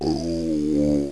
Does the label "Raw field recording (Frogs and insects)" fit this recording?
No